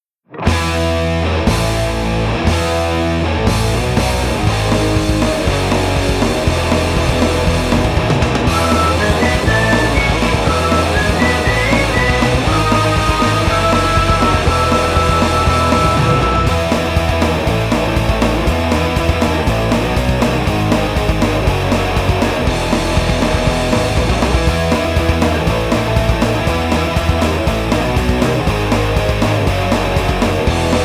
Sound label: Punched In A Punk Mosh